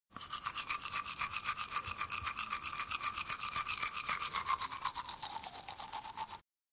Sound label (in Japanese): ohuro